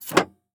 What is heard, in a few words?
Semi Truck Brake; Large Vehicles; Blastwave